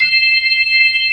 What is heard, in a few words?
HI SLOW C4, ToneWheel Organ; E-MU Formula 4000 Series Vol. 3; Analog Odyssey